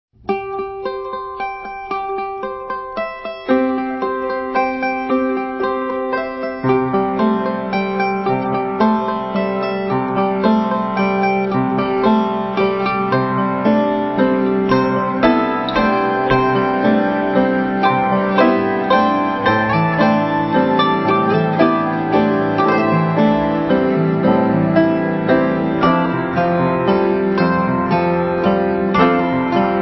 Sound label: telephone waltz